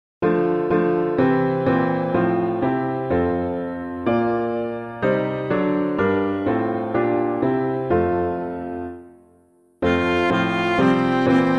piano, hymn; Come Ye Faithful Raise the Strain; Neale; AVE VIRGO VIRGINUM; Aisomen pantes laoi; John of Damascus

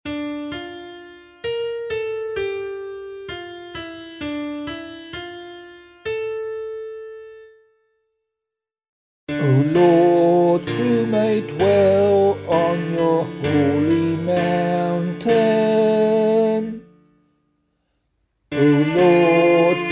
Psalm vocal

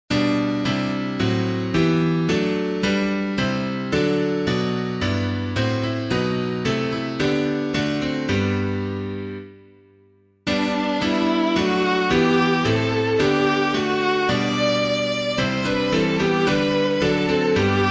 piano

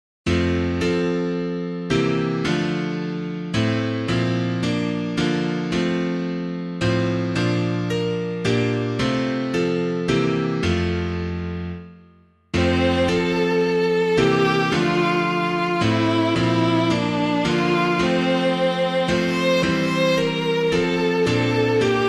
Forgive Our Sins as We Forgive, piano; Herklots; BELMONT

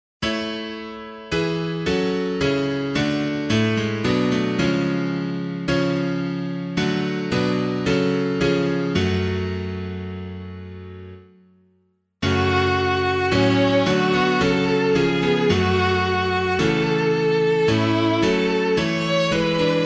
Come Lord and Tarry Not, piano; Bonar; SAINT BRIDE

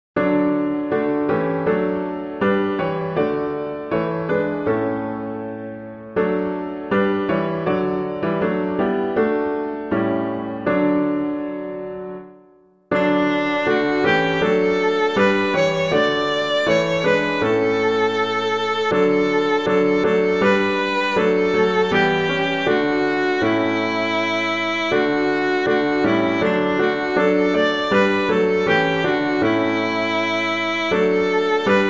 I Know That My Redeemer Lives, piano

